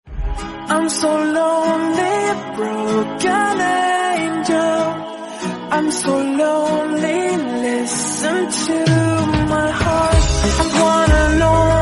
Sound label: english song